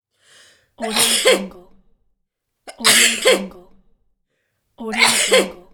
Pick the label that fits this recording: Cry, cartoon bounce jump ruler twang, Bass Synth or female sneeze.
female sneeze